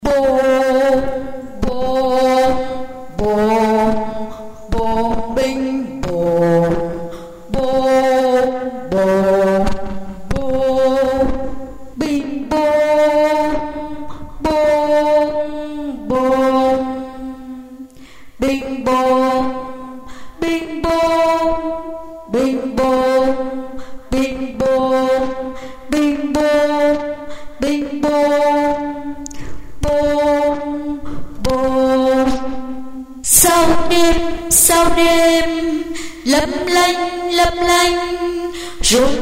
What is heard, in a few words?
basso